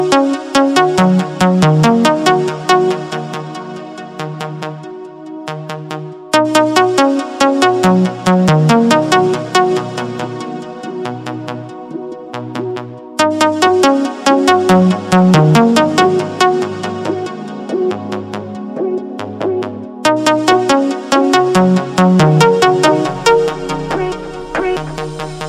Electronic

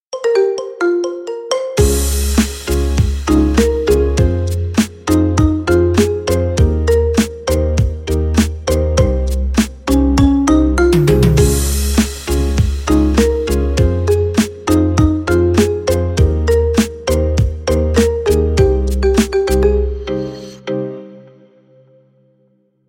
You Are Marimba

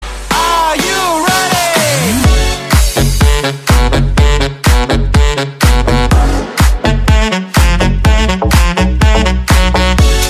edm

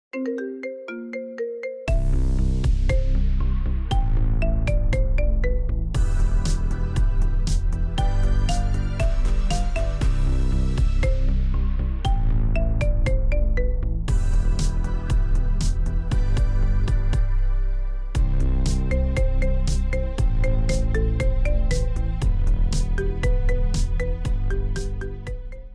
Marimba; dzwonki na telefon

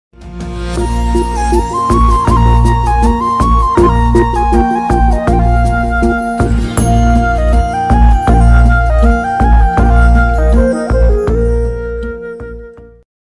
tiktok emotional tune

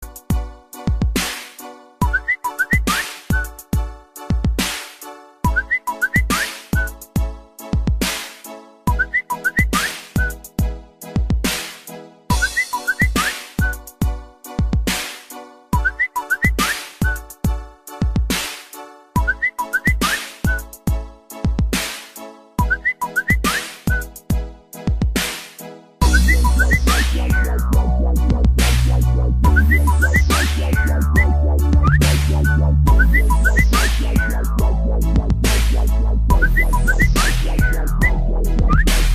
samsung s7 new, dzwonki

